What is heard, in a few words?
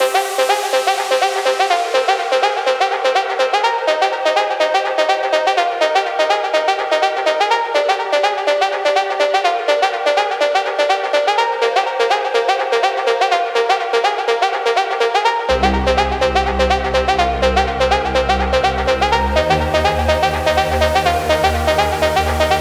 melodic techno; babylon 2; w a production